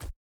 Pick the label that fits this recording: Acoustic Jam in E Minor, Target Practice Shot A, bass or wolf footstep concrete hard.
wolf footstep concrete hard